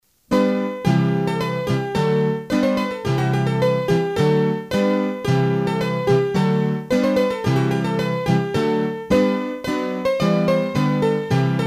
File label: Rondeau, hurdy-gurdy; Rondeau de Luglon